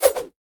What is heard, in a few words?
fire, combat weapons; CosmicRage